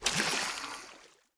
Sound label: snakeboss, monster